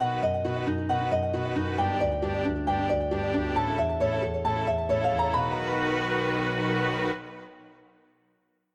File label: quest complete; Cow Life Sim RPG; Cow Game